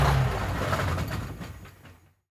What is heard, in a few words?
loco stop; Mining